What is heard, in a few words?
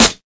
2000s clicky acoustic snare sound a key; modern snare drum